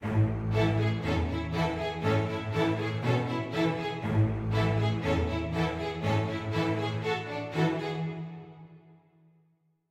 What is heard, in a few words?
dark forte start